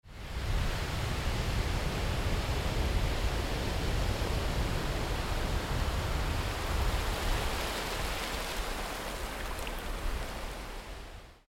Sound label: sea